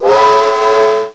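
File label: TRAIN WHISTLE; MACHINES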